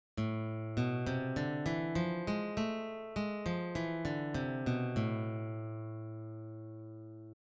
a harmonic minor scale, guitar

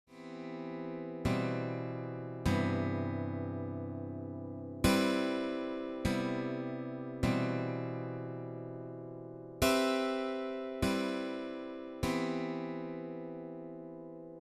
seventh chords practice, guitar

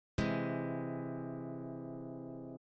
C aug triad, guitar

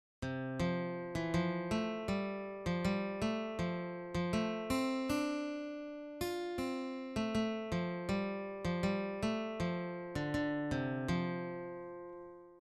voice leading learn, guitar

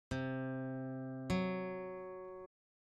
interval tritone

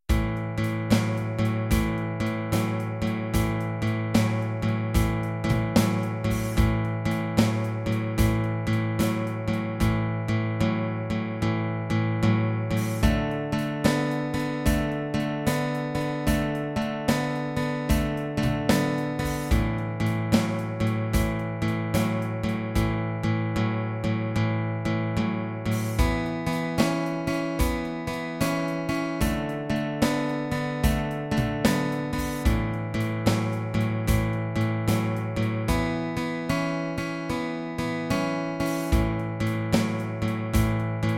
5-6 shuffle in A with drums; 12 Bar Blues

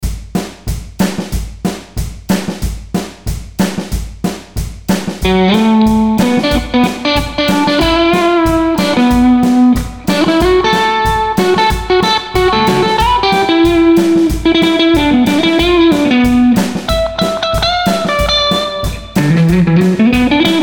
Rock Solo

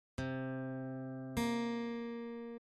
interval minor seventh, guitar

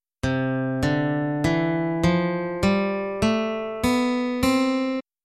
c major scale up the scale, guitar

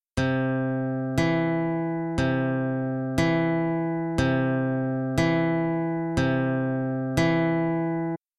steady bass, fingerpicking; guitar

